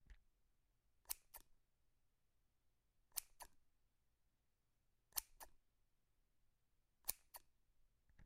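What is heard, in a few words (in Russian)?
ножницы щелкающие при открытии и закрытии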